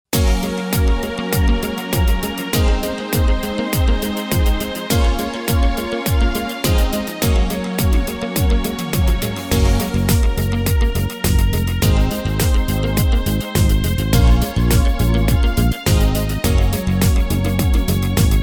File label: Ragae medley